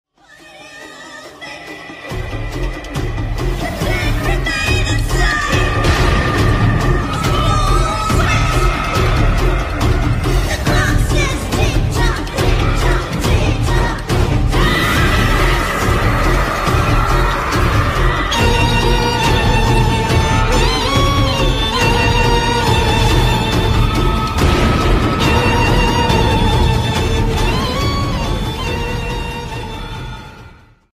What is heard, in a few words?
horror bgm ringtone; demonte colony 2; demonte colony 2 announcement bgm; arulnithi